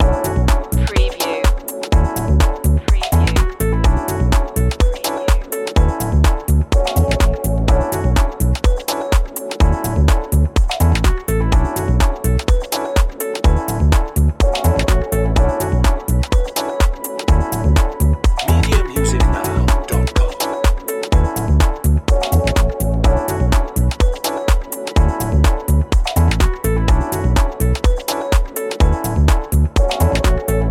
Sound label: lounge loop